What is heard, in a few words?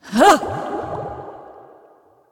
Water Elemental Attack